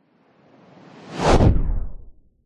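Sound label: science fiction spacecraft whizz by fast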